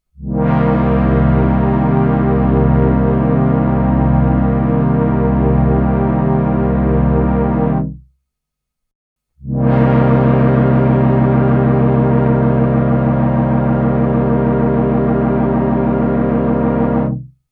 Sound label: Space Chorus CD400